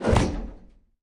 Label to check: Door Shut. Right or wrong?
Right